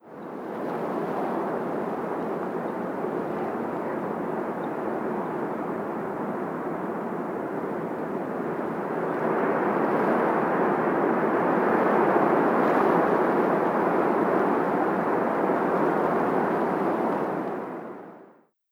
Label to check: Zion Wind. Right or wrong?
Right